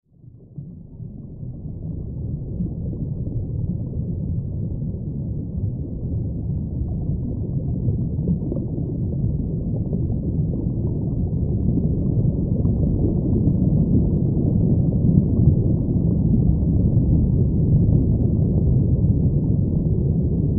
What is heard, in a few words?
Underwater Rumble Bu, ambience